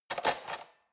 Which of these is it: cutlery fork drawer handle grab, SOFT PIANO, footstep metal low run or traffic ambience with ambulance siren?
cutlery fork drawer handle grab